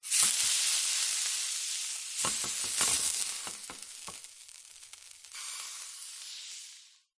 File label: food raw chicken breast drop into pan hot oil fry pan movements sizzle; zapsplat